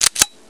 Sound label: hammer back, weapons